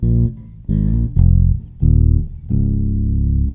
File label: Fret bass lick; MELODIC SAMPLES